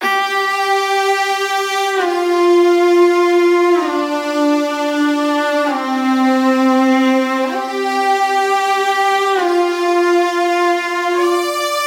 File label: Violas - Dm 130Bpm; Construction Kits; STEMS; Ghosthack; SkyRealm; Kit 24 - Dragon Mountain